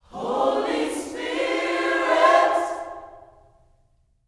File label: H SPIRIT, Gospel